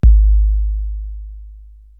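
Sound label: bass drum, Korg TR-Rack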